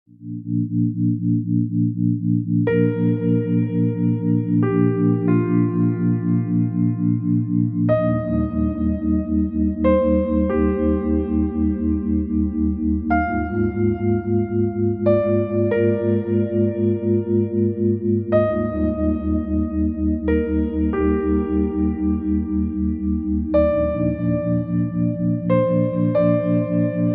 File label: Meditative ambience